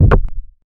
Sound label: for a missing move, fail